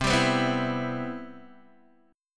C Major 7th, Guitar DADGAD

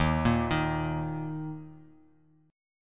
Eb 5th; arpeggiated; x,6,8,8,x,x,x,x

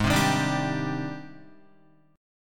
Ab Major Sharp 11th Ab down Guitar; AbM#11 strummed; JGuitar

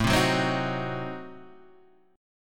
A Diminished 7th A down Guitar Open G

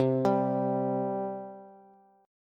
C 5th C Bass; C5 arpeggiated; x,x,10,12

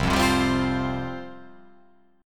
C Suspended 4th C

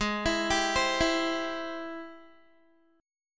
Ab Augmented Major 7th Ab Guitar; arpeggiated; Ab+M7; JGuitar

